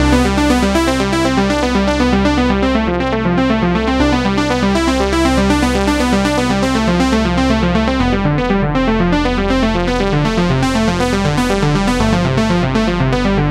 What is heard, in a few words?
synth brass